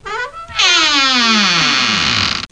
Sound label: hinge; duke nukem total meltdown